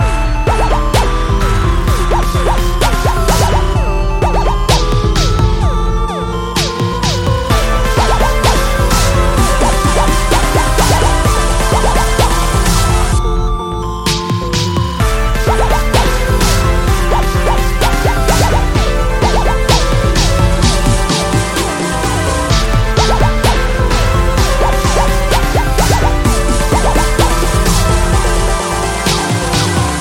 Dance

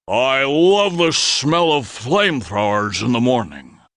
flamethrowers; Nixon

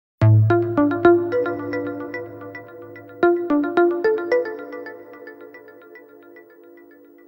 Best Message

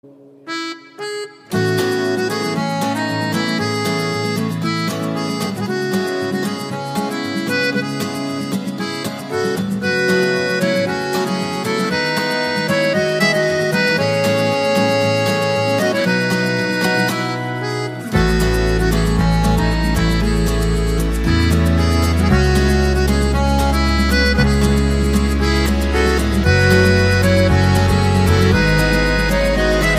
Acoustic; Asgore

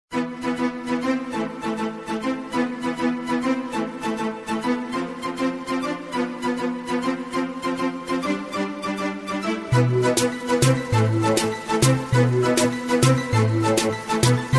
BASSU KORG

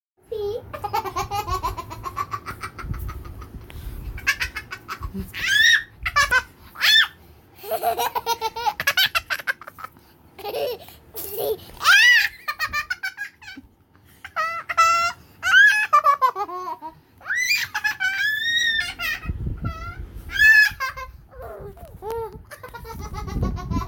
Baby laughing

